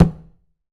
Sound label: R CONGA, Drum Mashines; EdgeSounds; Drum Mashines VOL-1; R-100 DRUMS